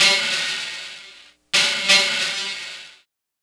Subtractive Beat

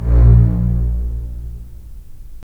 STR, STR Full Short; Roland - LA Composer vol. 4

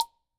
Guild MG10C-1 Perc, Drum Machines; Guild MG10C-1 Sample Pack; 300 Drum Machines

